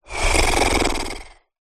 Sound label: animals horse snort human; zapsplat